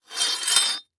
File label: Weapon Broad Sword Scrape On Stone; AdobeCC